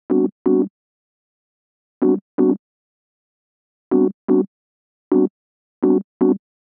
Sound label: 125 Rhodes Dom A, Keys, Nu Jazz House; PowerFX - Nu Jazz House